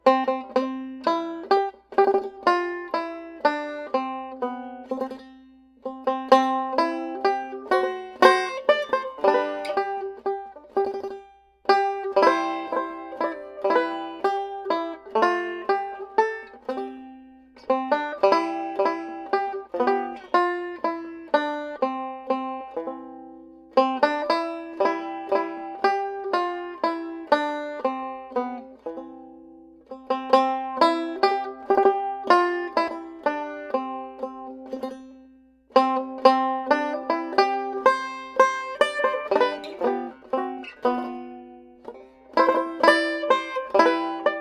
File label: Guitar; Assassin's Creed Syndicate